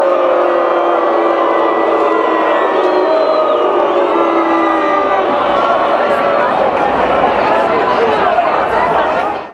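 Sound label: boo usa, football songs and soccer chants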